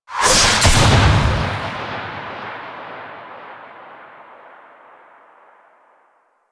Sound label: Comic Whip; Warframe; Yareli Quest